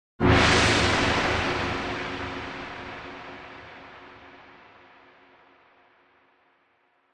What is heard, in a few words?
Gong Gong Sngl Hit Low, percussion gong